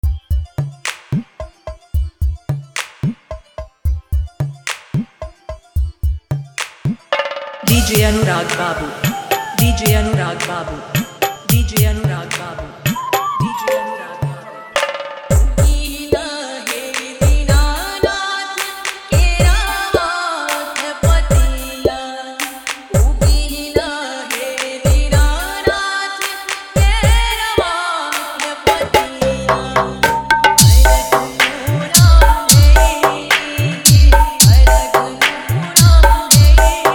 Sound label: Chhath Pooja Song Hip-Hop Jhan Jhan Mix